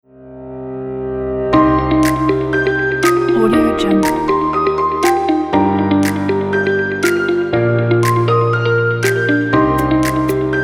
upbeat corporate

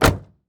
mini van door close; prosoundfx

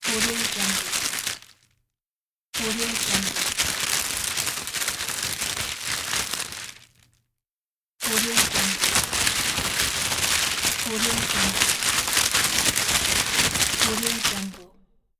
the rustling package

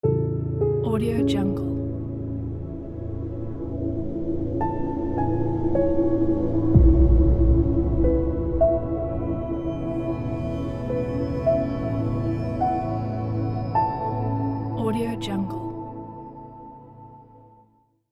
breeze motion ident

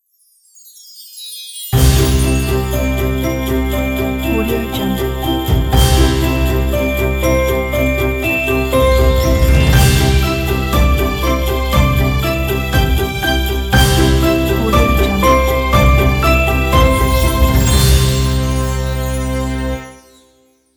christmas logo

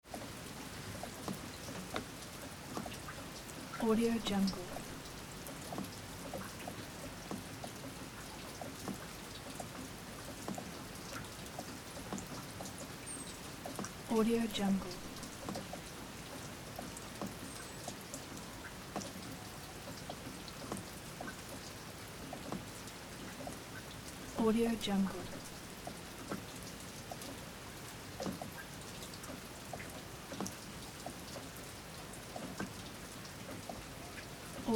light rain on wooden floor

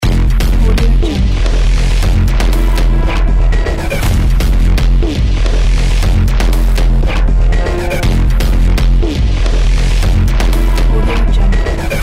thriller horror loop

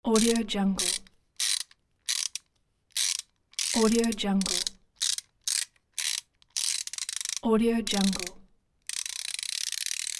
wrench with ratchet; kaelv

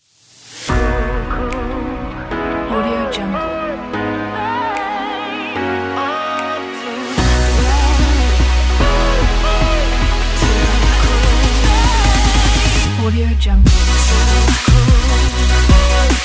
a future bass; riffart

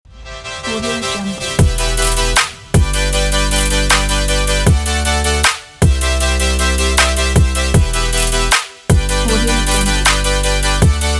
future bass

